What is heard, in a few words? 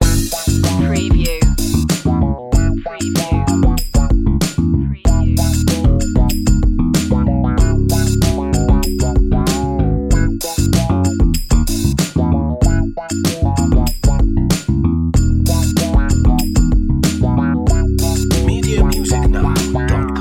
funky and soul loop